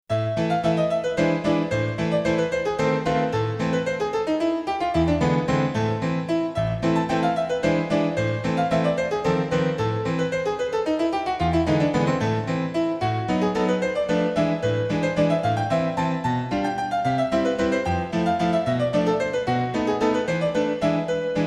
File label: MAZURKA IN A MINOR; CHOPIN